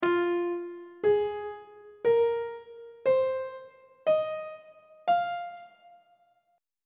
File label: f minor pentatonic scale; on treble clef